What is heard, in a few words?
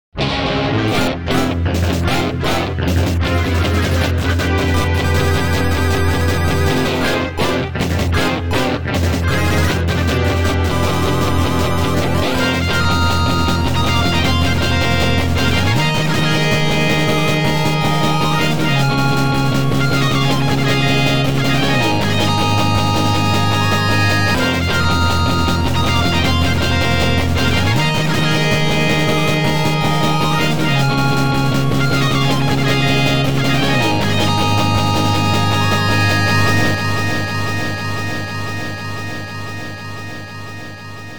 Attack, guitar; shining guitar